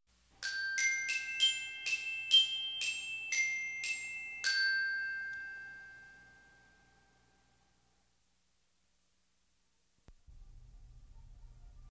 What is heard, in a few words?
Dinner Chimes